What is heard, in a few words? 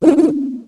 hoot